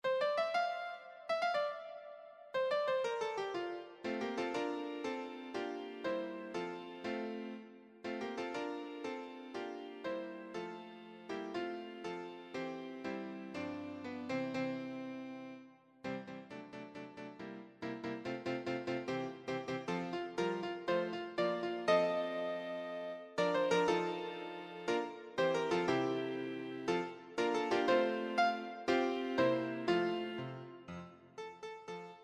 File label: audio sample piano